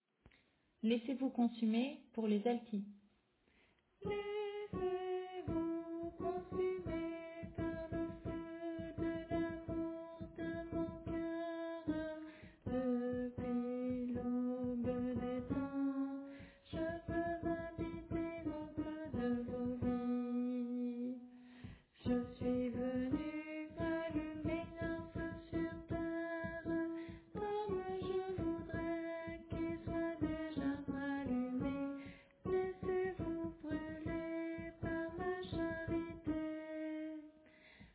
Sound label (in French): Alto